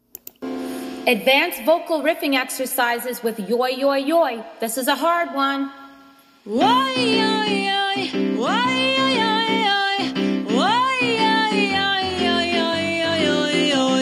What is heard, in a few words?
advanced riffing e woy yoy yoy